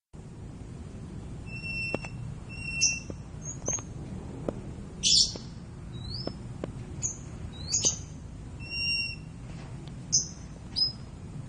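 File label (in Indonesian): meninting besar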